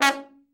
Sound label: trombone; Best Service; Killer Horns